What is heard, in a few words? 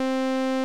fm sawtooth; Chiptune